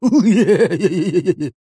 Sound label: cartoon laugh male med high pitched crazy bonkers